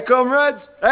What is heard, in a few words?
sample, male laugh